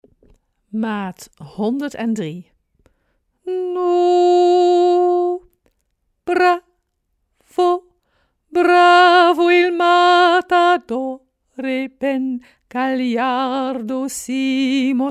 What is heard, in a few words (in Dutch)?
Bas